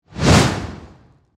Magic Wand Cast, Horror, Magic, Wand, Cast, Spell, Heavy; Magic Sound Effects; BlastWave